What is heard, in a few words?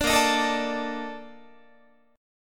A sharp Major 11th Guitar, chord; strummed